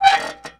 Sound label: METAL SQUE; PERCMETALIC